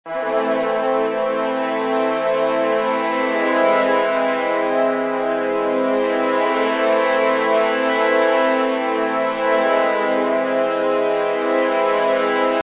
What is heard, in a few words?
harmony brass end, Unusual harmony in brass section; epic orchestral track